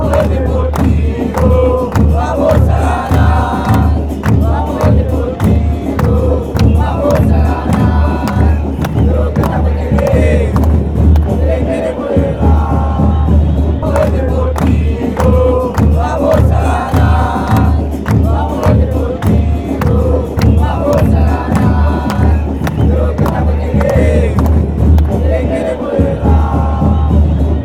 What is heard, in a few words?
deportivo merlo chant